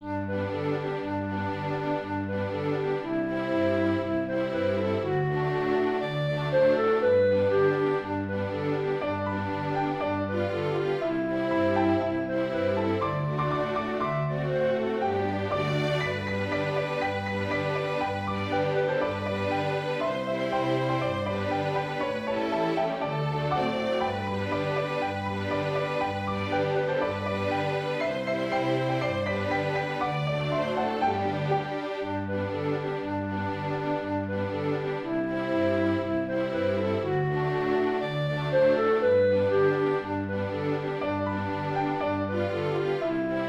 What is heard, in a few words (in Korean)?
징글벨; jinglbell